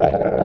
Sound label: ALPHA ORC DOG Hurt